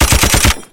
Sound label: ak47 Sfx